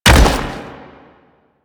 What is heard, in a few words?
Step; Warframe; Sentients; Erra; Foley